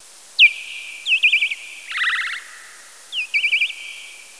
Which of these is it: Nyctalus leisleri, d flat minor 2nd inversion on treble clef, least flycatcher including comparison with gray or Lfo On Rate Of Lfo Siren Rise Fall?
Nyctalus leisleri